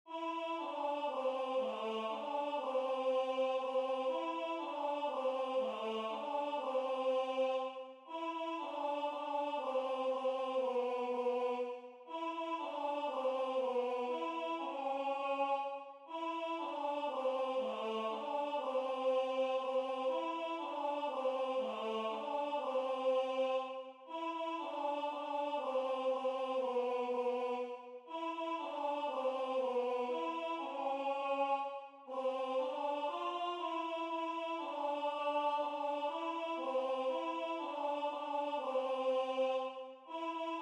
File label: Alto; La Caccia